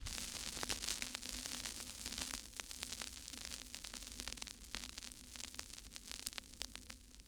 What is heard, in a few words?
Vinyl Crackles